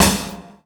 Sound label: snare ROCK; Sonic Foundry (Sony Creative Software); Ugly Remnants VOL-2; Beatstuff